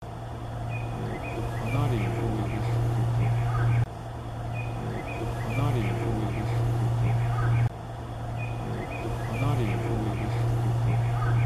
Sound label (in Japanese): humei, tori koe